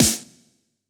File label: Elec Snr, drum